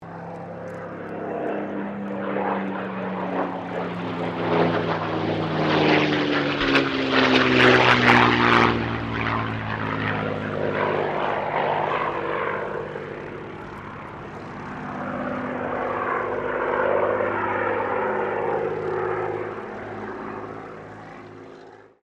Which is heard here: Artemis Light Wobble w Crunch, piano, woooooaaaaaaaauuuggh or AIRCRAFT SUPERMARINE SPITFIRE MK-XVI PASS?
AIRCRAFT SUPERMARINE SPITFIRE MK-XVI PASS